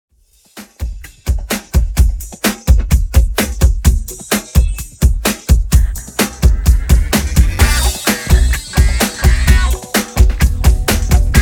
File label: 128 Bpm; Redrum